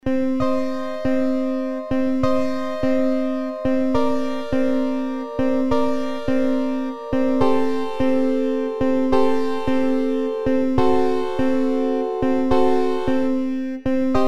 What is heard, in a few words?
Synpianoforte Keys